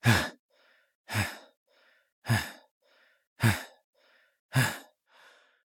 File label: breath sprint; Escape from Tarkov